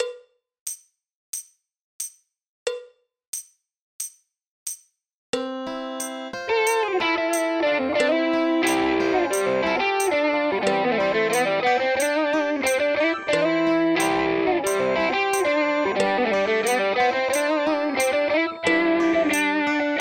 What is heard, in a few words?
Drum Kit Playalong; Grade Three